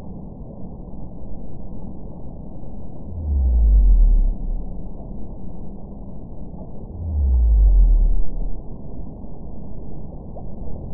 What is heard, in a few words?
sei whale doublet